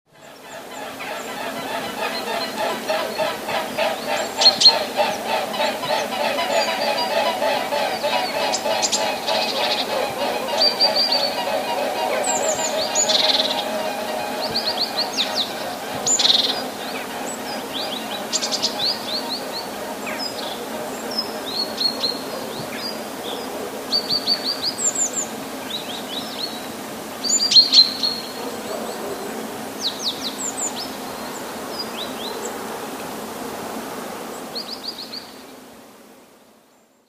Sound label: cygnus olor